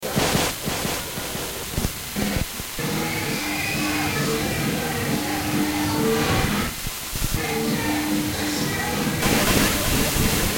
ambient Delight

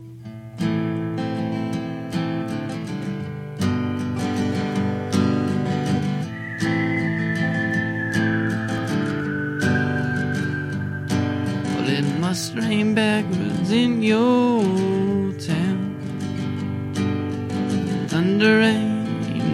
Backwards Rainray Guitar And V

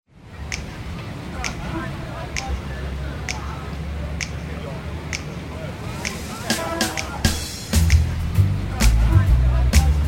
harp; The Water Engine

